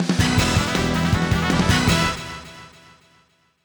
Stage Start; Wild Guns Reloaded; Reloaded Ver.

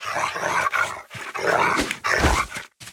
Warbeast Savage

